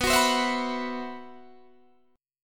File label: C Major 7th Flat 5th B